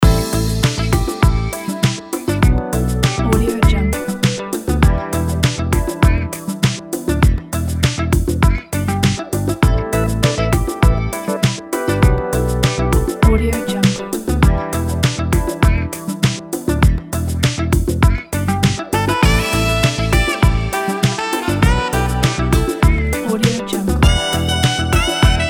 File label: contemporary smooth jazz